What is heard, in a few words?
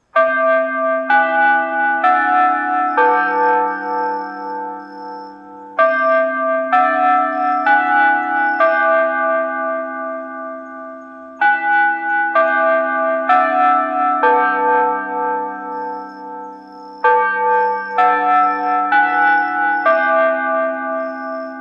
bousai gyousei musen; 東京都防災行政無線; 福生市; ウエストミンスター・チャイム